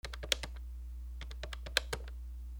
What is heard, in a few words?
PHONES